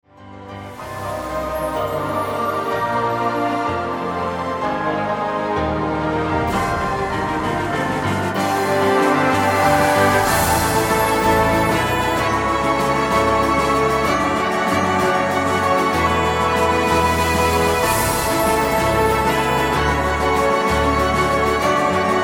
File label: Czech Drumming Song